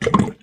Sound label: weird, sfx